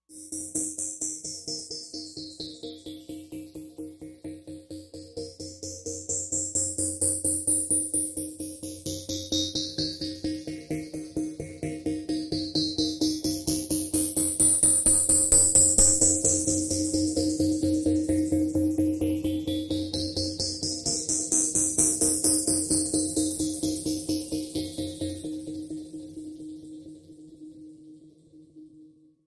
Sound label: Insecurity Magnifier, musical